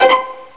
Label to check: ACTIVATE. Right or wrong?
Wrong